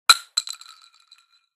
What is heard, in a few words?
spread casing; spreadgun